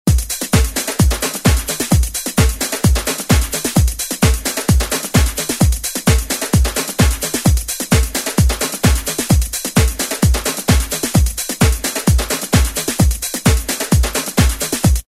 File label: Slammin' 130, Roland M-DC1